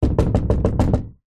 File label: household knocking door wood slight rattle